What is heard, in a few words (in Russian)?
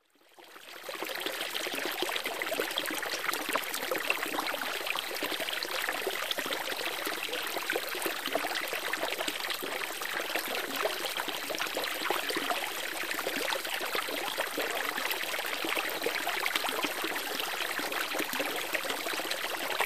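фонтан в бассейне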